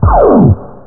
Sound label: SHOT LAS; parasite